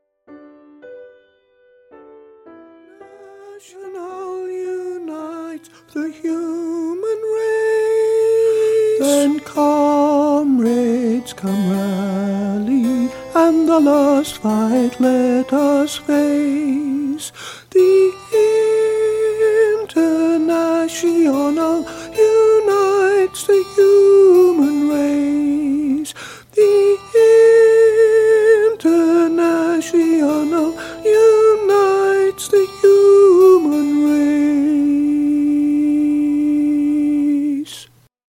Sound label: alto vox; Internationale